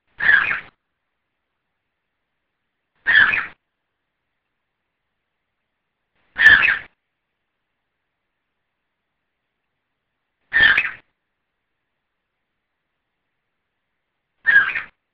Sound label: Peep, bird